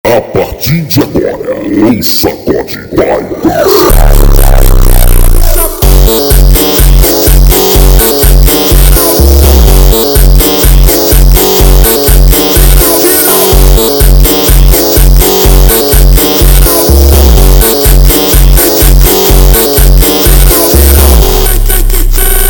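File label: Montagem slowed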